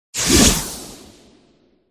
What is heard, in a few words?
cat attack